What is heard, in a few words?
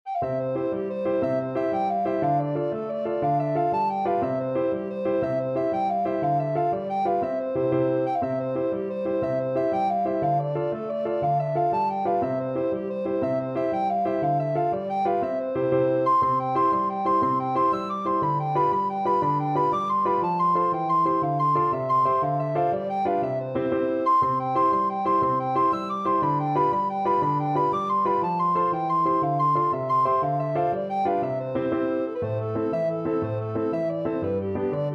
irish jig medley, alto recorder